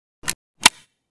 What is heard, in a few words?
vz61 holster, weapons; Blindsides Reanimation Pack